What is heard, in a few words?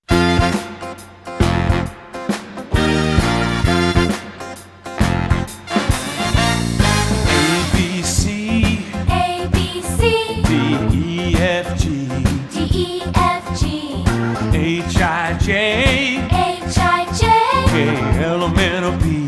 abc rock